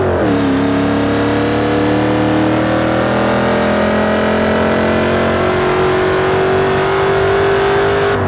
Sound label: third, vehicles; charger2012